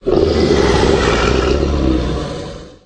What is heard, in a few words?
die, creature; stalker